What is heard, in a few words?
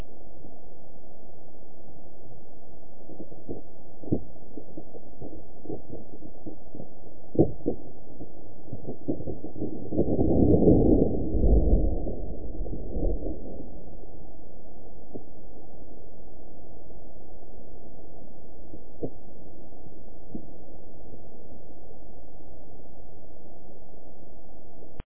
iceberg